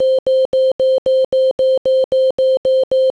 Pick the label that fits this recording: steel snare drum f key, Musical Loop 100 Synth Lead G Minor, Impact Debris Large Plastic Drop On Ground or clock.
clock